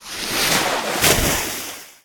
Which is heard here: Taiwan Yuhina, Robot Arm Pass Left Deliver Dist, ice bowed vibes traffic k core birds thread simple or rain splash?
rain splash